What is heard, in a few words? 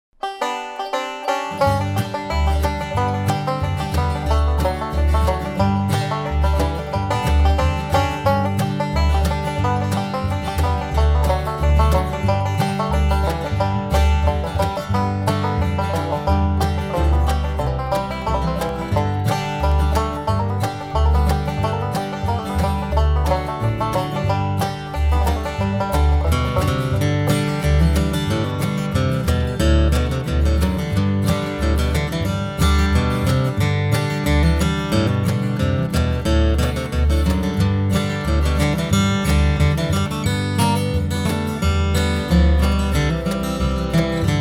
Key of G; Bass; Wildwood Flower